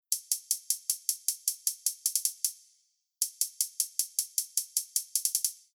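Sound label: Reverb Delay hi-hat; Rev One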